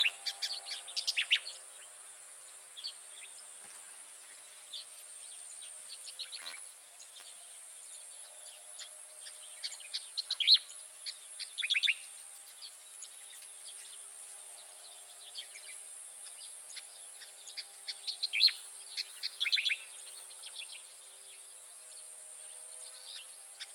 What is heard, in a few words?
Striated grassbird